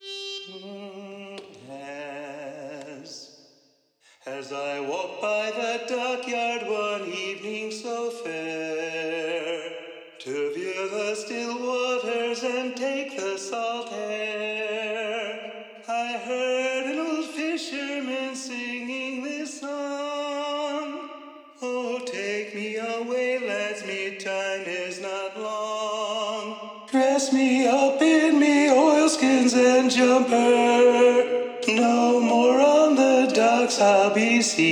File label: Tenor Predominant; sea shanties